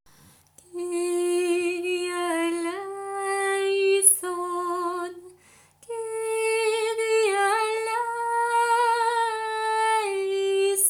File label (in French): Prière litanique Kyrie